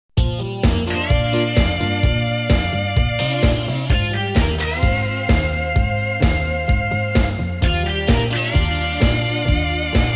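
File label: B flat live track; female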